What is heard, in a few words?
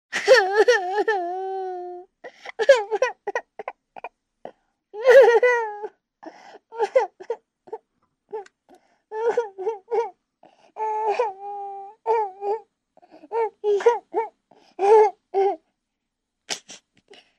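crying of a little girl aged 3-4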